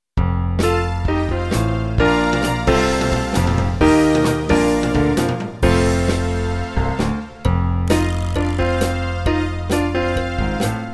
Catfish Blues

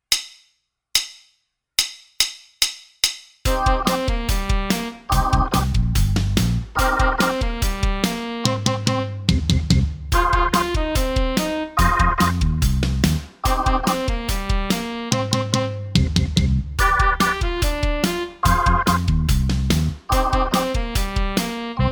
Echo Me Blues Track

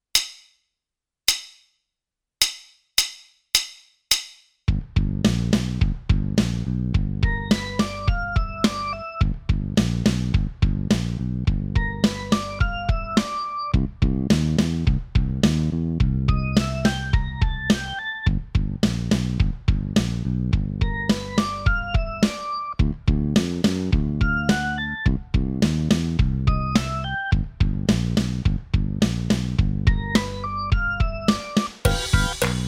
Rock It Blues Track

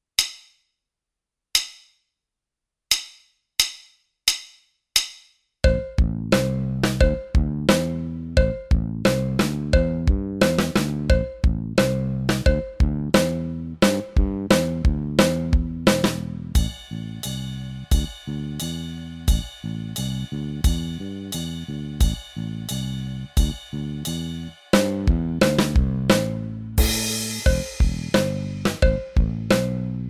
Rock It Loud Track

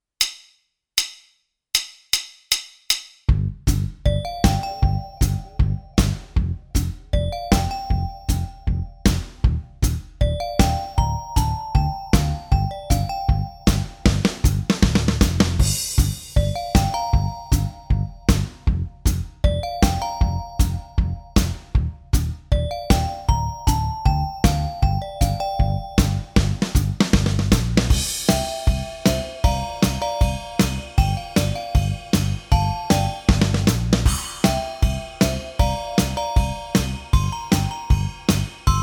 Keyboard Adrenaline Track

